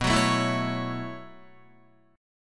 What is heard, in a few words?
B 9th Suspended 4th down Guitar 4F,4A,5C,5G,5C,6E; B9sus4; strummed; JGuitar